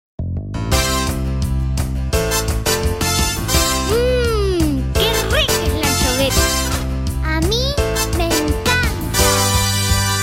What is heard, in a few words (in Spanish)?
Karaoke